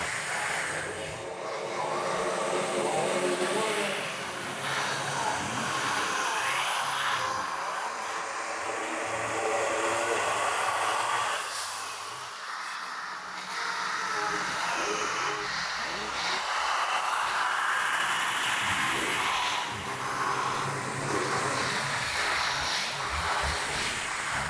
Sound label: Whispering Voices; Phasmophobia